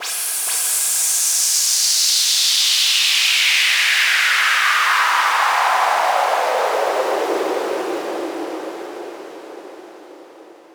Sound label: Fx Downer Noise